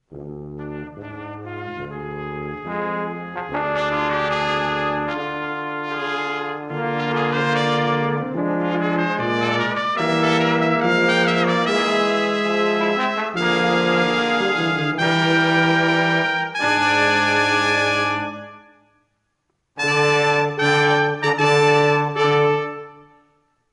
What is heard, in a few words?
Brass, Intrada